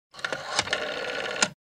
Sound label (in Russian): phone